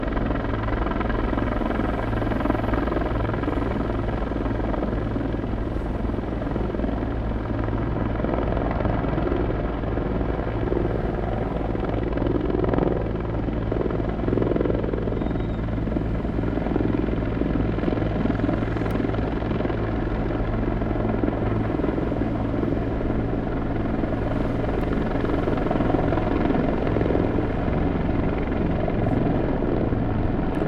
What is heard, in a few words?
Helicopter raw